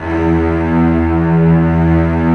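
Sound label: cello E1; Digital Sound Factory Virtuoso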